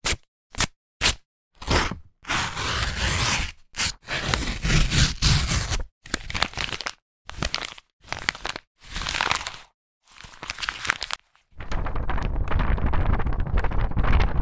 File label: paper; zapsplat